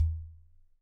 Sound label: Udu Low